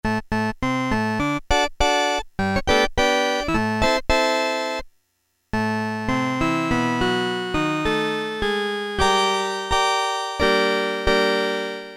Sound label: harpsichord, Casio MT-68 preset sounds